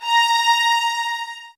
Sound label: A, soft violins